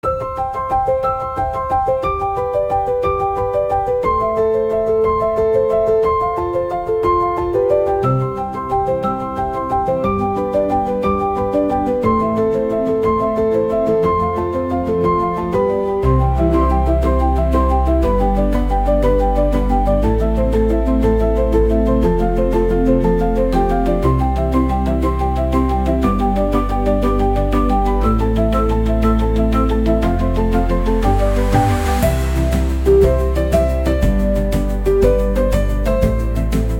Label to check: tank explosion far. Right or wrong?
Wrong